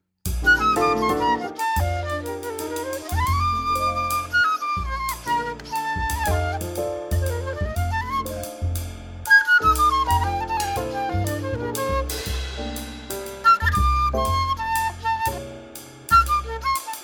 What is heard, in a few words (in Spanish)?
impro harmonico